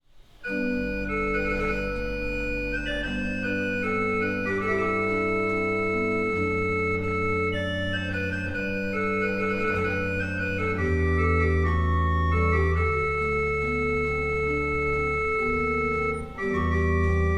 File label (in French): Bourdon 8 Sesquialtera 2 rangs Gemshorn 8 avec la tirasse et Sousbasse 16